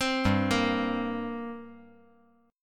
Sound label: G Minor Double Flat 5th; 5G,4C,5E,5A; chordshape; down